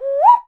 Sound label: bird calls, percussion